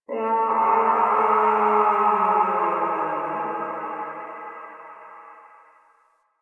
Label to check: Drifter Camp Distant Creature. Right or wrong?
Right